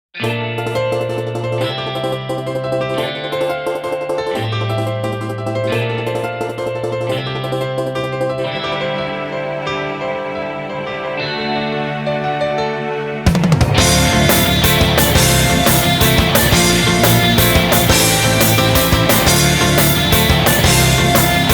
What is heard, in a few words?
instrumental